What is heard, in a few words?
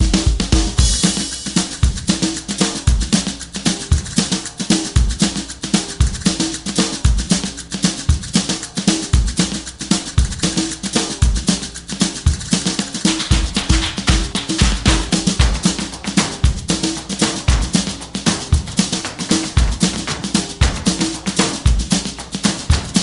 Perc; power pamplona windows gamerip 2007